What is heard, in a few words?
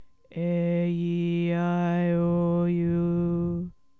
aeiou Low; A E I O U Sung in the low range